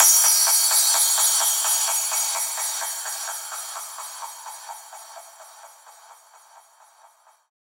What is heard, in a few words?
fx crash, Key E, Bpm 128; Loop Nerds; Big Room House Allstarz